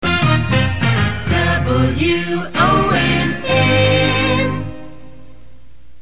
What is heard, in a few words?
jingle; WONN